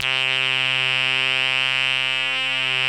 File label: SQUEEK, LEADS; Masterbits; Vintage Voltage